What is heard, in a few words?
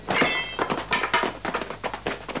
Fight; 特酷声音素材库